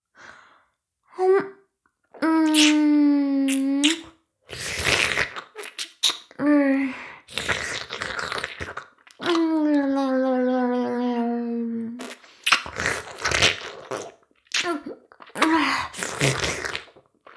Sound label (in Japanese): 耳舐め音声, ASMR; 援交JK魔法少女マスカリチェリー